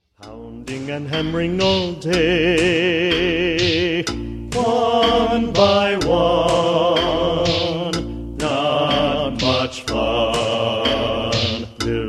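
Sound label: pounding and hammering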